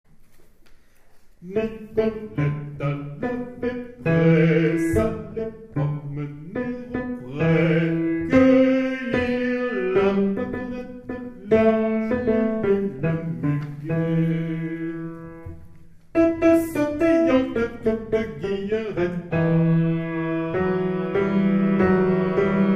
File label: Basses; NICOLETTE